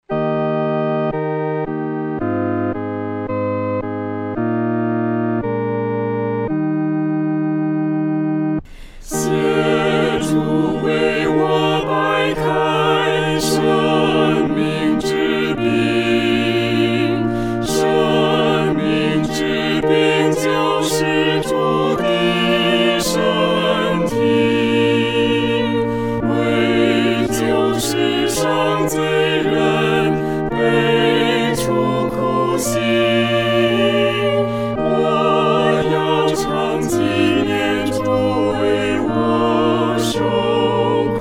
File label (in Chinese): 合唱（四声部）